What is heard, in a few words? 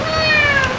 Single Meow; Simple Player